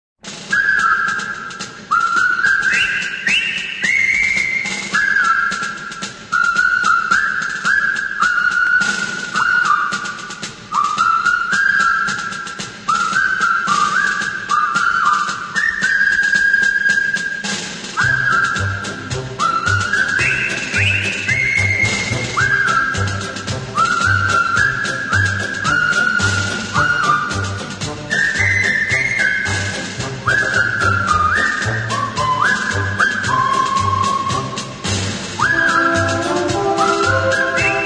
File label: Colonel Bogey March, carillon; Allerlei 2004-2005